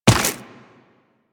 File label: Run Dirt, Footsteps; Warframe; Tenno